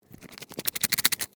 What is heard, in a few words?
Insect Wings Move, Animal, Insect, Wings, Movement, Shake, Bug; BlastWave